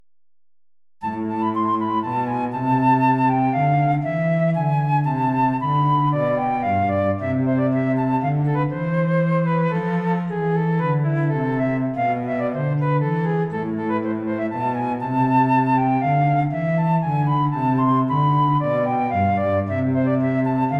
aria para flauta violonchelo

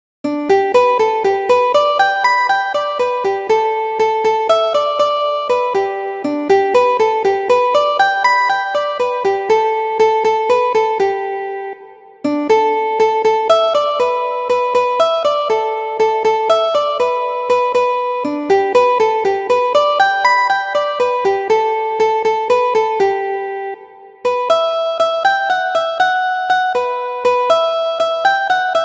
guitarra, alegreto; Ferdinando Carulli

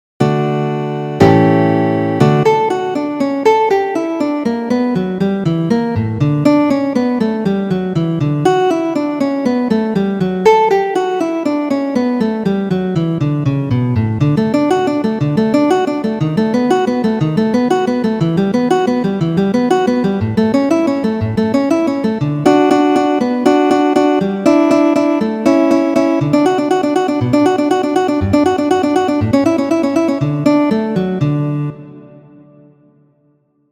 preludios; Ferdinando Carulli

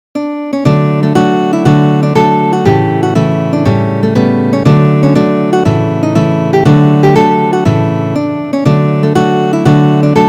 contradanzas; Ferdinando Carulli

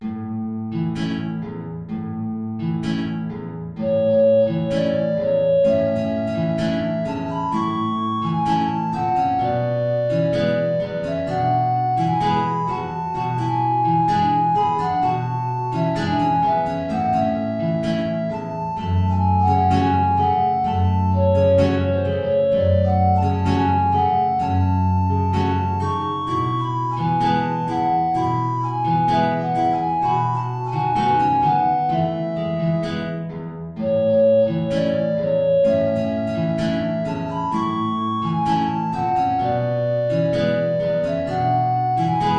tango

